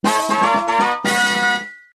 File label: Treasure Box; Zelda